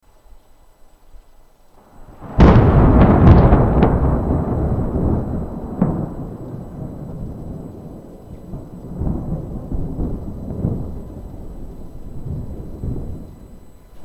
thunderstorm

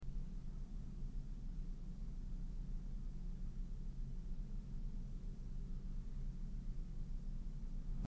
Room tone

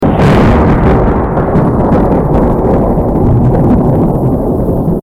Thunder

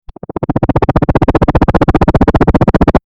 Helicopter flying; Sound FX

